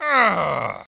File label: Banjo